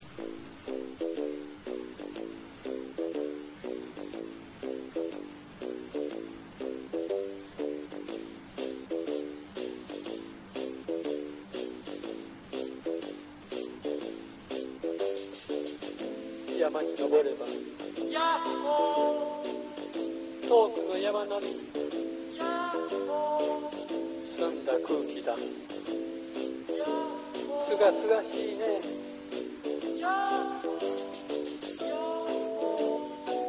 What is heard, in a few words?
taiko